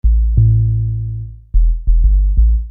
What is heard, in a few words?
Low Sub